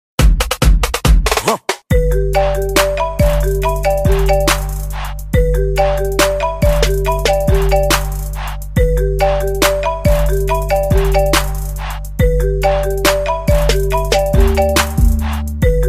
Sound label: marimba iphone